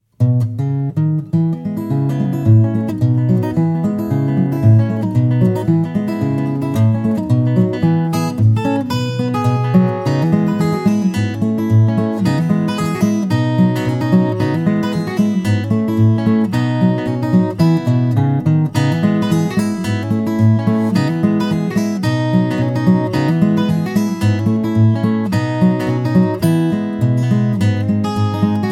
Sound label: Guitar